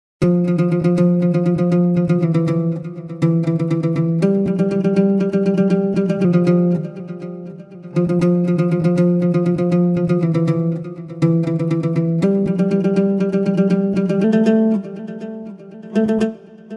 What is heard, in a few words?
Scoring Acoustic Guitars Rhythmic Pedals